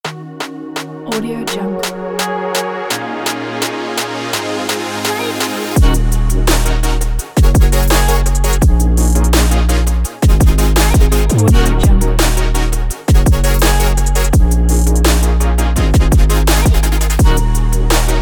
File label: future bass tech logo; vezalir